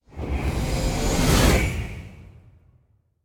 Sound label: defeat magic; smite; chiron